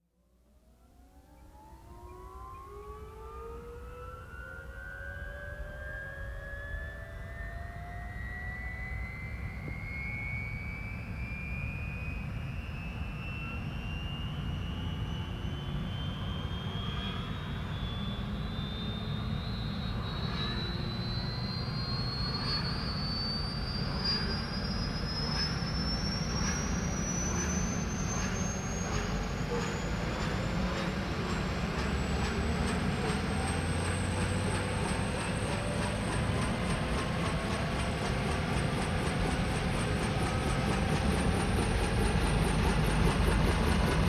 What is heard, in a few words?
Military Helicopter Start Idle Away; Vehicles; Aircraft